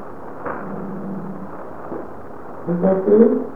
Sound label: growl; Lost Church; Olympus Digital VN-1800